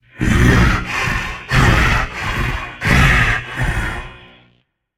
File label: breath, voicepack; smite; jorm